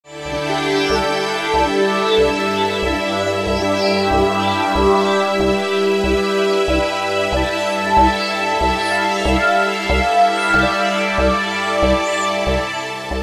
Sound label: Ambientmix